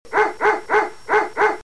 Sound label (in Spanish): ladrido